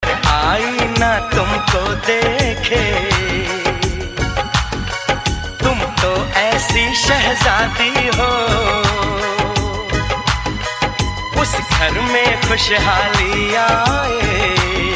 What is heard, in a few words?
Old Ringtone